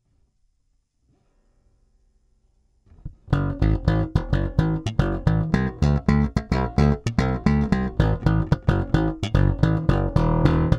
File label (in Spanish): slap hi mid, bajo ARIA